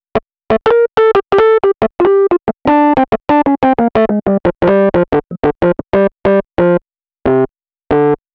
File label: Rhodes Solo, Cinematic Funk